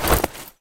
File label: Snowboard Detach; Talking Tom Gold Run